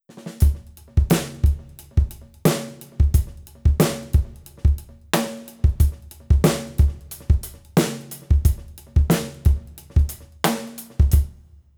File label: shuffle; electronic drum sets